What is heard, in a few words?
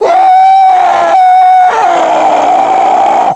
pain, hgrunt; halflife